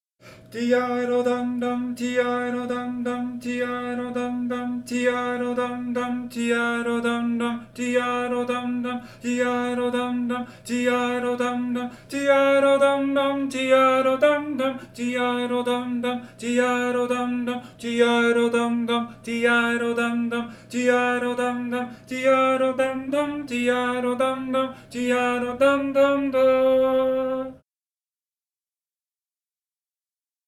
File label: tenor; spring show